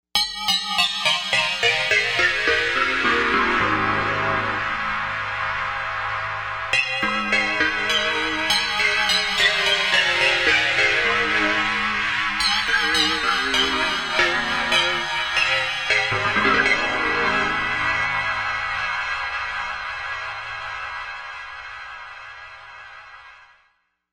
The Bells, Novation UltraNova; Factory Patches

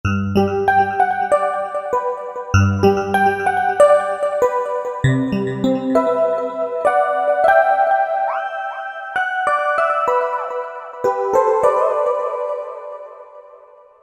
Harmony Bells, Roland V-Synth; Factory Patches

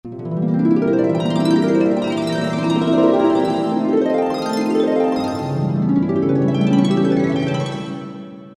Harp 9th Scrl

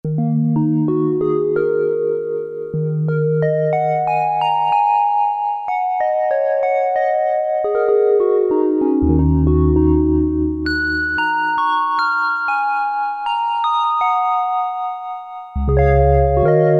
Vibes Piano, Korg Poly-61M

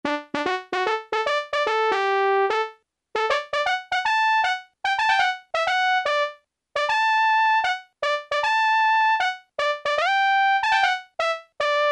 TRUMPET, Yamaha SHS-10

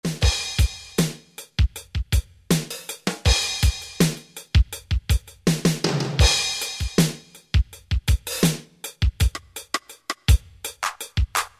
Rhythm Set, Roland D-110; Factory Patches

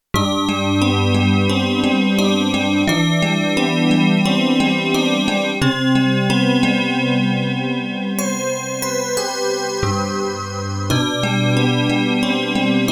Waveola Keys, Roland JD-800; Factory Patches

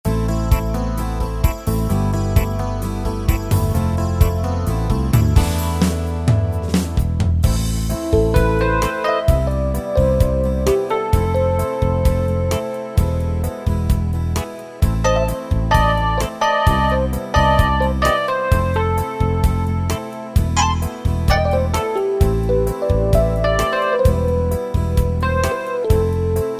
60's 8 Beat, Pop & Rock

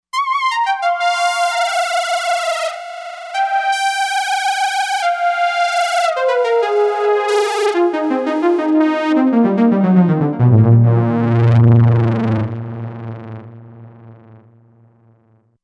Mini Growl, Roland Fantom XR

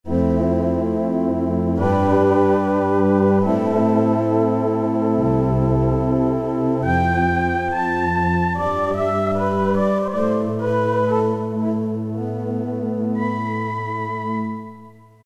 Chorale, Roland MT-32

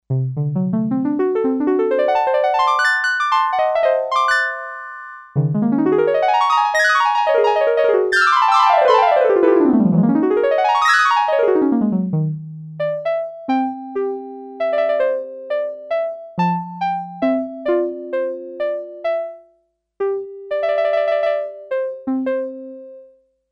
Harp, Sequential Circuits Six-Trak; Factory Programs

